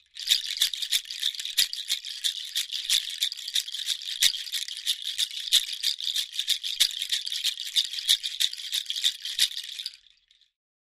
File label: Shaker Bracelet Natu, percussion shaker